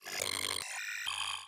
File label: Glitch Sfx